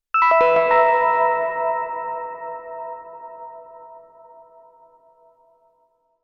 Bells with Novation PEAK

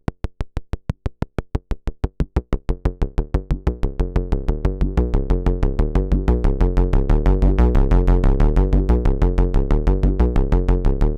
Seq1 Man Closed Filter Sweep; ACL System 1

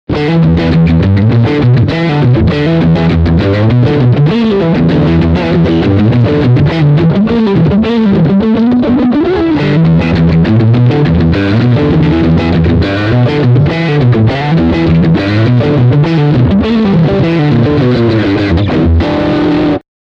Riff Front Singlecoil

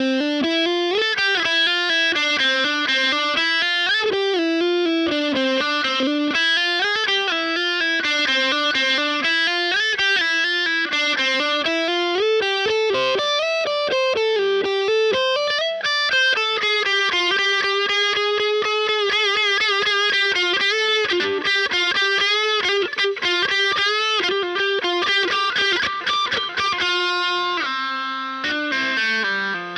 Morley Wah Boost

